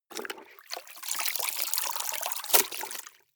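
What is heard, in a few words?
clothes water up down long, household; haus-garten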